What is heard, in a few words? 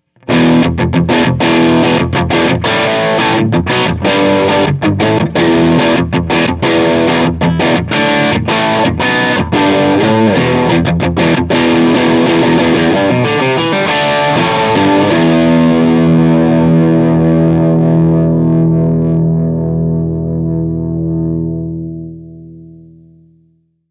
bright crunch